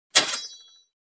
Sword: Scrape On Armor; Fast, Metallic Shing With Ring Off. Medium Perspective.